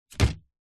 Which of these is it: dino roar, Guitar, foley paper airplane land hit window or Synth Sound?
foley paper airplane land hit window